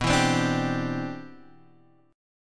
G 6th Add 11th B, chord; G6add11/B Chord {X 2 2 5 3 3} strummed; 6add11:1,3,(5),6,11

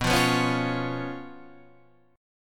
B Suspended 2nd Flat 5th B; Bsus2b5 Chord {7 8 9 6 6 9} strummed

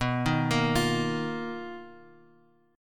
B Major Sharp 11th B, Bass Standard; BM#11 arpeggiated; chord shape; 19,18,20,22; JGuitar

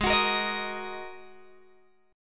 Ab 7th Suspended 2nd down Guitar Open G; strummed; Ab7sus2 Chord

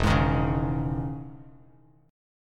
E Major 13th down Bass Standard; EM13 strummed; JGuitar

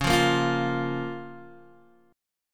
Db 6th, Guitar, Open G

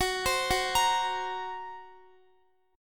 F sharp Major Flat 5th F sharp Guitar; F#Mb5 arpeggiated; x,x,18,17,18,18

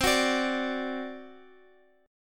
C Suspended 2nd Flat 5th C down Guitar; Csus2b5 strummed; Csus2b5 Chord

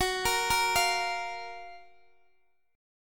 Gb Major 7th, Guitar DADGAD; chordshape; down

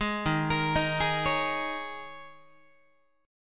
D sharp 7th Suspended 4th, Guitar; D#7sus4 arpeggiated; JGuitar

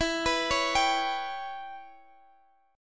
C sharp Minor 7th Flat 5th E

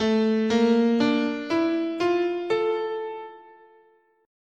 A Japanese

